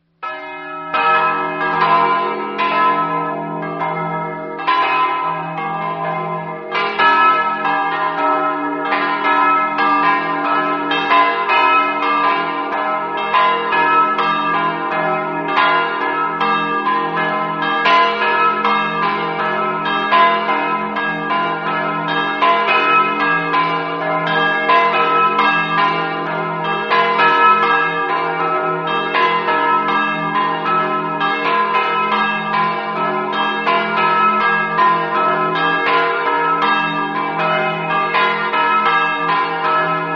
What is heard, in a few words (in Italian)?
campane